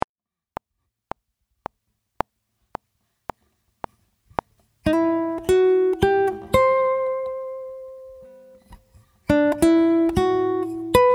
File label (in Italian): tema, Chitarra fingerstyle; SPUNTI DI STUDIO dal pianoforte; Fabio DeSimone